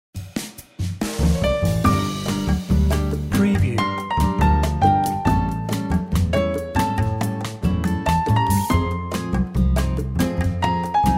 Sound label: Soft Jazz